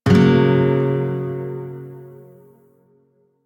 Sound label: Shawzin Yo Chord A, Music; Warframe